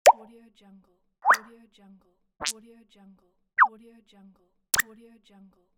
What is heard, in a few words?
message